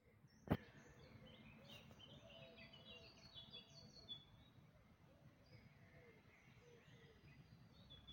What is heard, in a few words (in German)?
Moenchsgrasmuecke, Dawn Chorus; Mönchsgrasmücke in Berlin; Der Dawn Chorus 2025 fand wie jedes Jahr im Mai statt